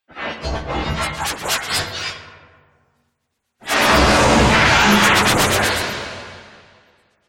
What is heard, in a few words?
Dubstep Scifi Effects